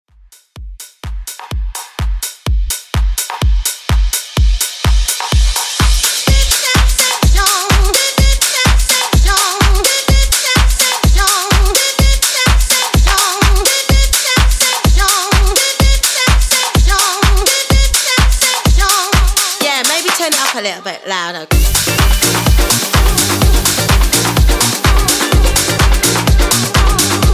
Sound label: Deep House 126 Bpm